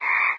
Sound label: frog